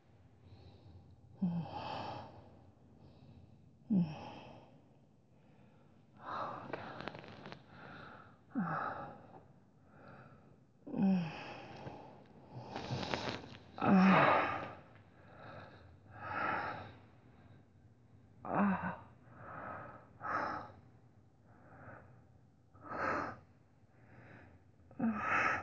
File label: scream